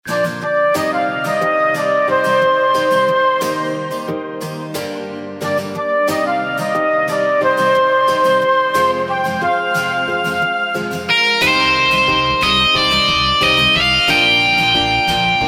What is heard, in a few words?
Instrumental Ringtone; Bollywood Hits (2014)